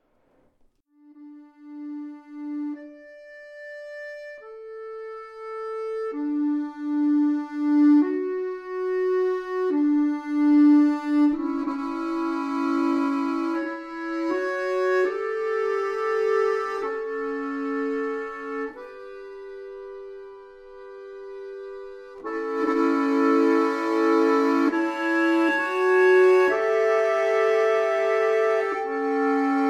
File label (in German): diatonische expeditionen; Volksmusik